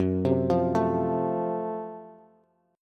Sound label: F sharp Minor Major 7th Flat 5th, Bass; chordshape; down